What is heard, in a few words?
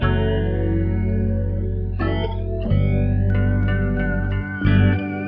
090 jazz smooth groove; synth and electronic loops; melodic loops; very well